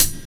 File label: dampened hi hat closed sound B key; Tuned drums